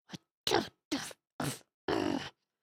indignant, emotes

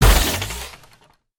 car backfire; aronwk collection; ndaudio

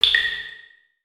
Drip; ninjago

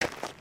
Foot Dirt Sneaker Walk, footsteps

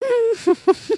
dance; emotes

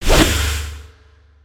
golden axe roll; weapons; aronwk collection; ndaudio

